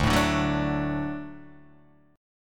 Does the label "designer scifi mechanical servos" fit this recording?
No